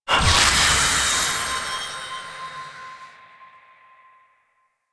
Confetti Burst D, Ambience; Warframe; Duviri; Bombastine Encounter

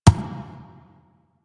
Ram Step Walk Dirt; Animals; DE; Warframe; Quests; Duviri

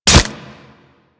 Melee Impact Flesh; Warframe; Kubrow

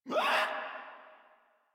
Infested Runner Pain; Warframe; Runners

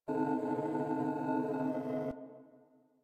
Nursery Amb Orbiter Room; The War Within

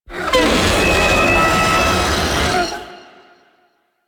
Vocal Attack, Enemies; Warframe; Teralyst; Ropalolyst

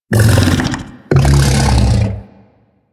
Undazoa Vocal Tranquillized, Hunting Critters; Warframe

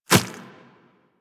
Infested Small Walk Default, Footsteps; Warframe

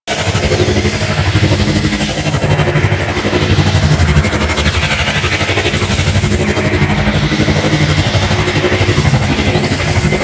Reactor Generator Loop; Warframe; Quests; Wraith Quest

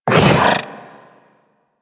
Companion Melee Swipe; Warframe; Pets; Zanuka; Foley

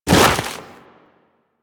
Walk Deep Snow, Footsteps; Warframe; Operator

